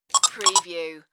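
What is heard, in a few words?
Electric Beep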